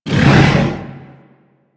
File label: Moon Loot Room Elevator Start; Ambience; Warframe; Orokin Moon